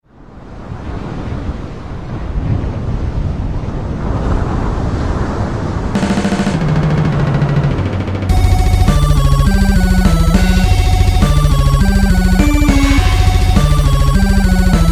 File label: Fight, eightbit, ambient; SuperOrxoBros HS18